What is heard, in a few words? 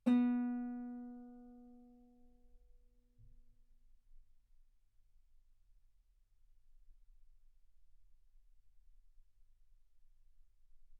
Guitar ff B3; sul B